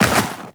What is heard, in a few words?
Walk Snow, Footsteps; Warframe; Tenno